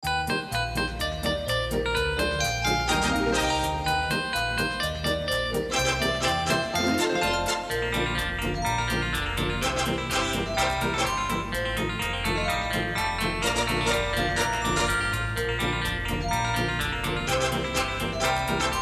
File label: cartoon wild west